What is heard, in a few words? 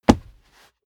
step barefoot wood plank single trample